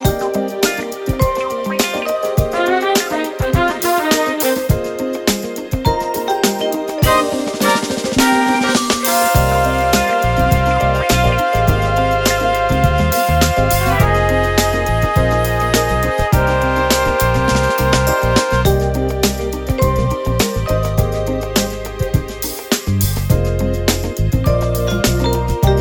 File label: Generic Groove